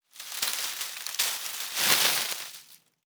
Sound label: leave shake